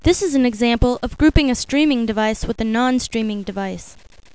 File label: duet